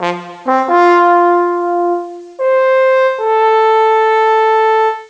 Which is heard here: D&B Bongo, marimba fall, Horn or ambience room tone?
Horn